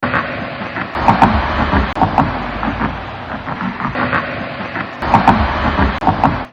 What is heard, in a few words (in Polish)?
stuk puk lup dup; kolonii robotniczej